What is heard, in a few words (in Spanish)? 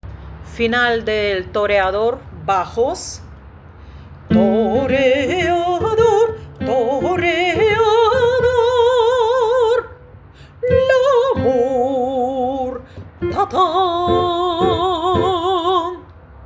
bajos vivat